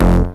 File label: Synth Bass; Vengeance Samples; VEE Synth Shots